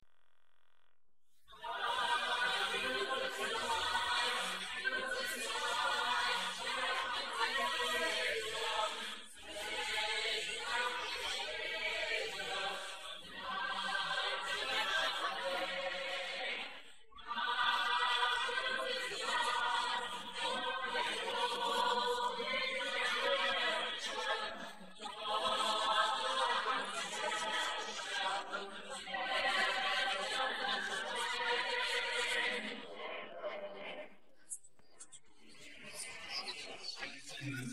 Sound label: vocals noise